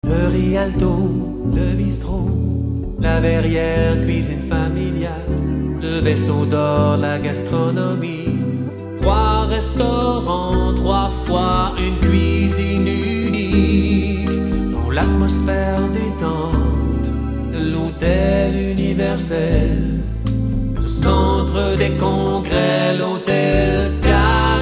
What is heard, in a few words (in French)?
CHANTER